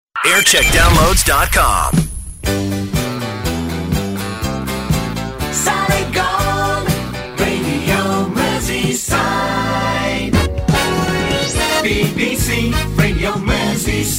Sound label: Jingle Montage; Radio Merseyside; JAM